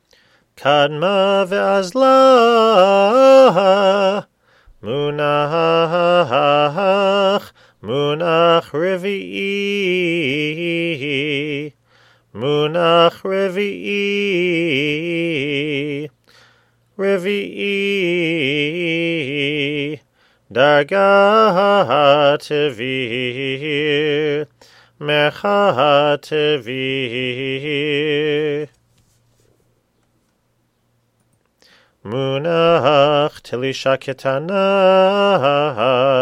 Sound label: Torah Trope kadma vazla rvii tevir telisha pazer